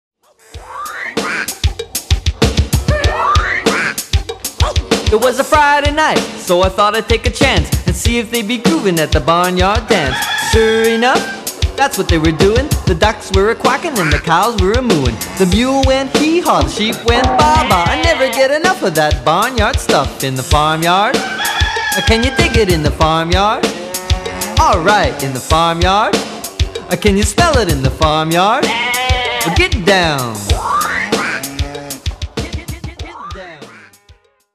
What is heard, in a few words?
Farm Rap